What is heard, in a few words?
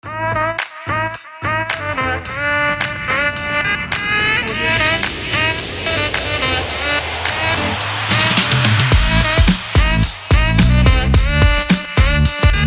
funk hiphop beat kit